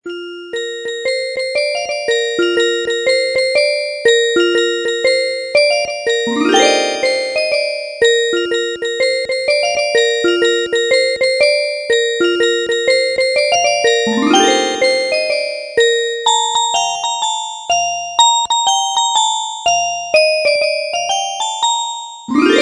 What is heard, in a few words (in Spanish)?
musica camion helados; carro